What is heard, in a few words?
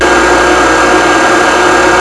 crane; Chase Hollywood Stunt Driver; XBOX